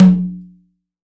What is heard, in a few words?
CONGA; STEREO CONGA; Cuba